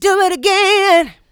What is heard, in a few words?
mixed vocals; Houseworx